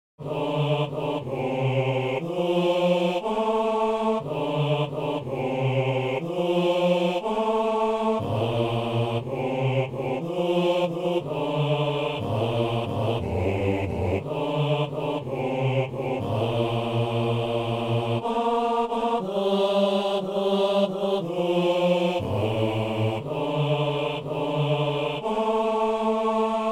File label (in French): messe simple, gloria, partie d seule, basse